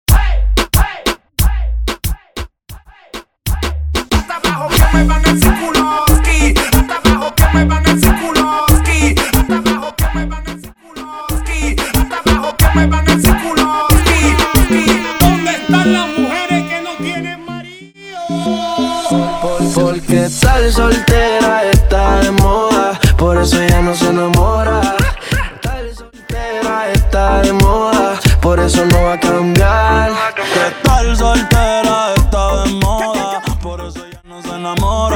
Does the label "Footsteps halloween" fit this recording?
No